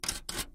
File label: move, Crate